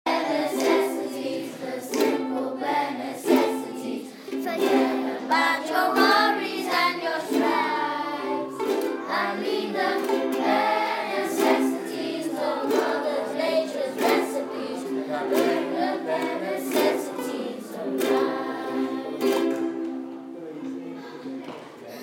ukuleles; year 4

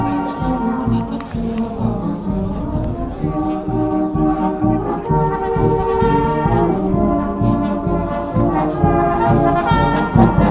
salvation army band outside stadium; sunderland millfield; stadium of light

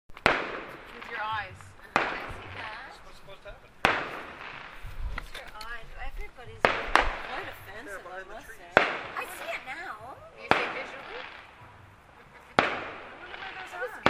canada day fireworks

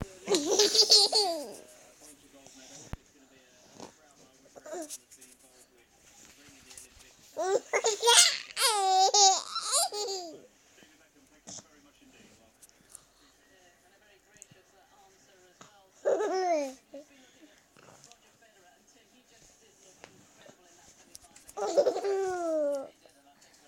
laughing

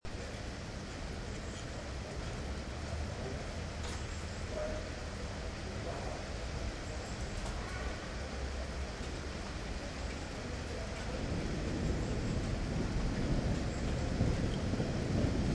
rainstorm in berlin

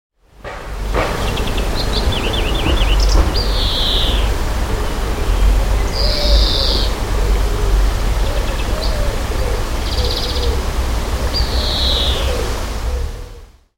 greenfinch

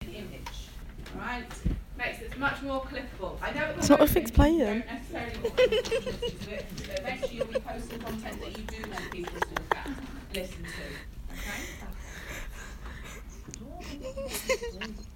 having a good laugh

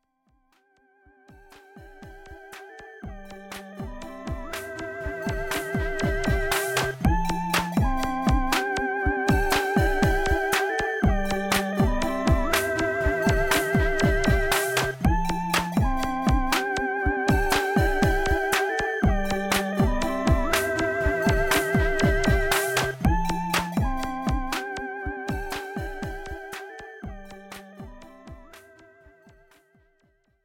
kaossilator noodlings upbeat

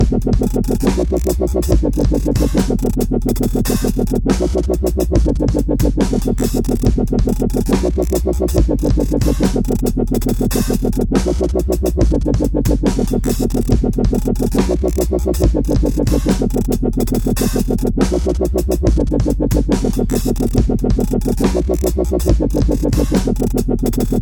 dubstep bass

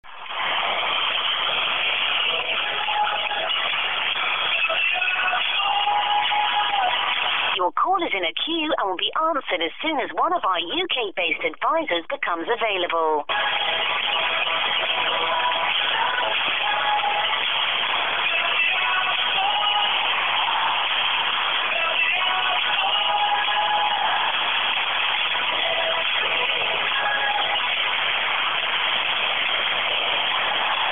hold music

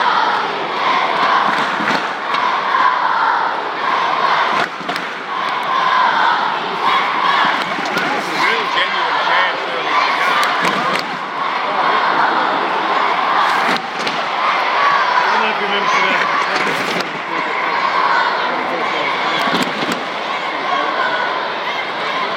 school kids sing out lets go aussies; socceroos anzstadium ausvrsa gosocceroos

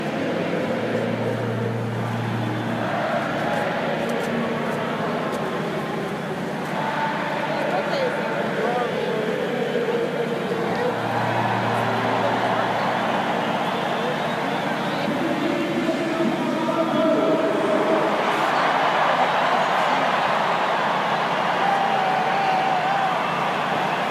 outside a concert at tcf bank stadium

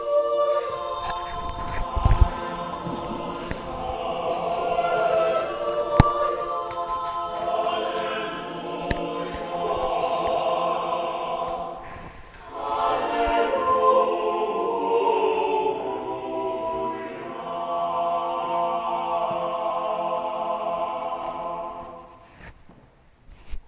gospel acclamation psalm

